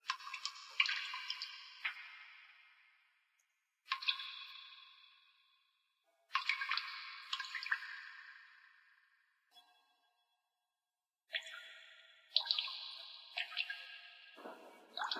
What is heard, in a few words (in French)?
egout